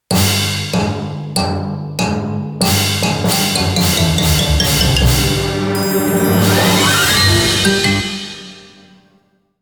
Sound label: Cat Bumper